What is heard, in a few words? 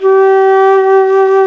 FLUTE, WIND; AKAI S-Series CD-ROM Sound Library VOL-2